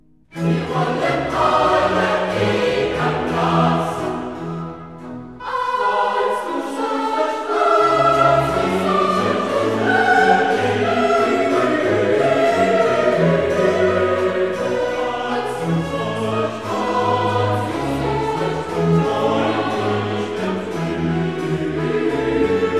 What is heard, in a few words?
Coro; Handel Masterworks